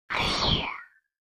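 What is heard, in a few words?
magic warp; magic worp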